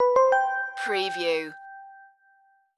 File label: idents ident